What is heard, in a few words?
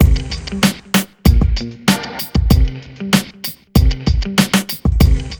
beat, Hip Hop R n B; USB Soundscan vol.19